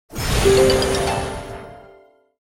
Symbol Bonus; fire Wizard; Axial

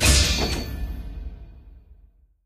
reel stop freespin symbol; Dragons Myth

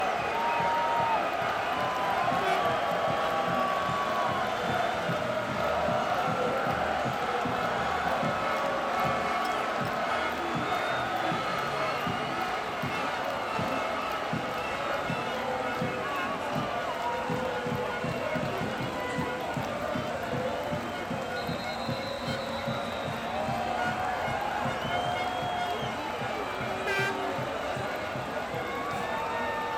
Bonus Shared Background Loop; genesis; euro Golden Cup Desktop

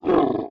entrance snow

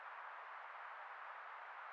Spin; Lock A Luck

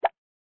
bonus reveal results; punk; cassandra

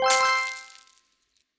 bar filled; Skillzgaming; gems odyssey 92 desktop

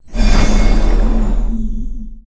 respin intro; adelia The Fortune Wielder; Foxium

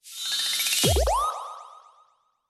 super star multiplier grow x3; riviera Star; Fantasma

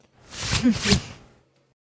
girl dust; astro Legends Lyra and Erion Desktop; Foxium

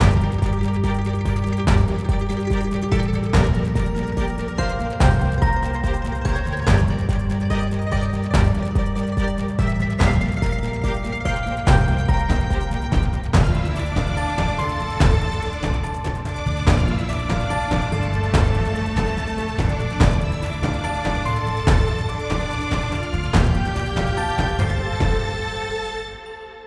Bonus Music Loop; Romanov Riches

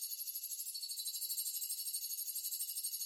anticipation loop; Recode; rampage riches Desktop

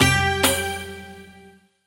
win nice; medallion; Fantasma

